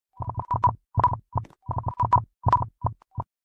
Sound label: subspace tripmine arm; roblox